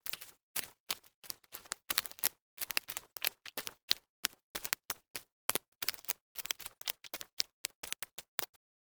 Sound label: forest